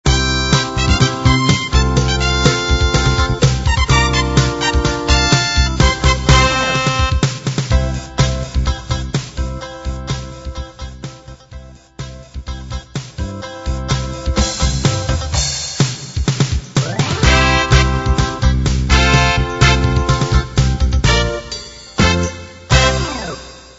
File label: Motown excerpt